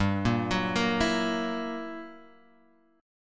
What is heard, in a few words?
G Minor Major 13th, G, Guitar, Open G